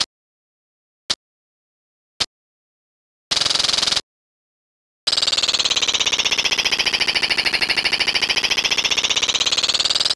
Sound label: click